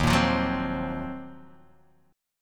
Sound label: D sharp Minor 6th Add 9th, Guitar, Open D; chordshape; 1,1,3,0,3,x